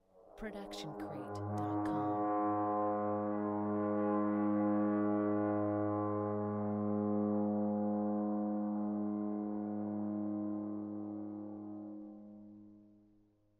Low Long Foghorn; SoundsCrate

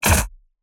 Sci-Fi Gun Shot; SoundsCrate

